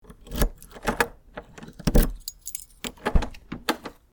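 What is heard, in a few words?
open door with key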